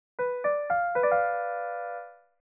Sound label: Bdim treble